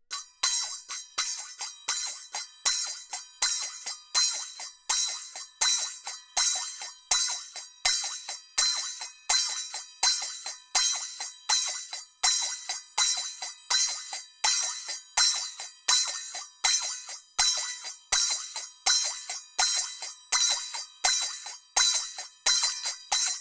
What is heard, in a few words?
Taal Loop, waves of bihu; waves of bihu vol 2